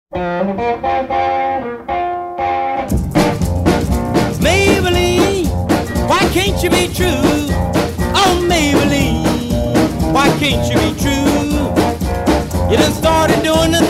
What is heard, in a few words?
homophonic